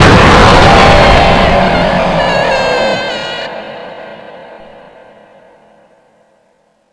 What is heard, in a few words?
jumpscare; five nights at black 4